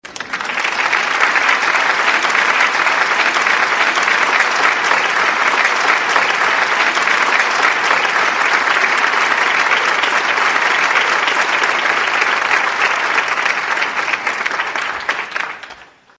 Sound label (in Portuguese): efeitos aplausos